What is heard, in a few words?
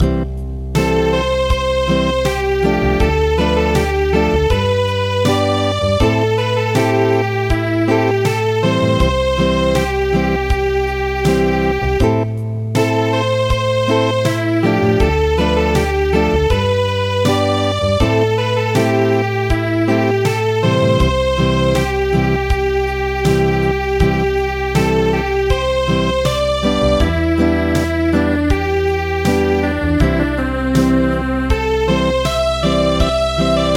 Acoustic; Cha puk